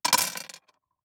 DICE on Glass, Throw and Roll, Standard, 6 Six Dice; Samples; ArticulatedSounds; DICE Sfx Party (Extended)